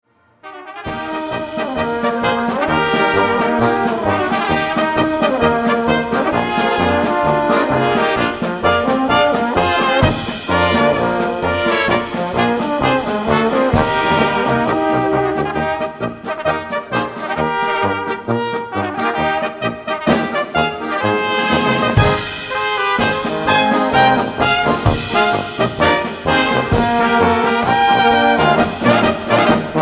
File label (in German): Blas Musik